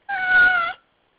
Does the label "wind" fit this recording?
No